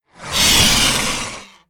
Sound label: Young Dragonhawk Enter Play